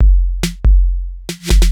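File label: DRUMLOOP ELECTRO 140; Techno2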